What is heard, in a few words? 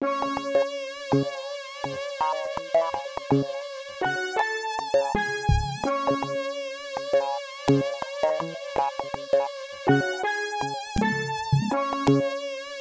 Melody, Hip-Hop